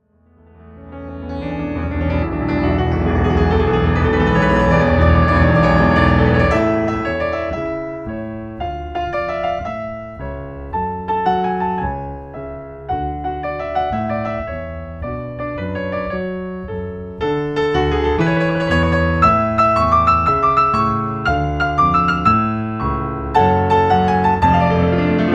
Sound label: concert for piano solo main theme; heroes of might and magic